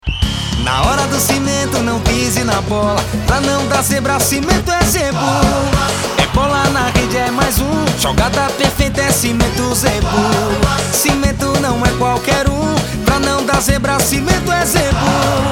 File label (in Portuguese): JINGLE FUTEBOL